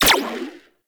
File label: Shot 2D Fire, Tommy Gun; Warframe; Tenno; Stradavar Prime